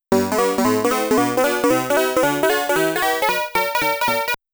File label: Opening Animation Music